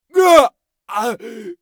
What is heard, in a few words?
bear death; eft